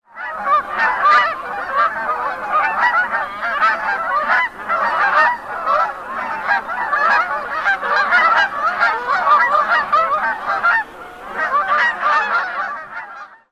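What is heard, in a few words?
Canada Goose large flock flying over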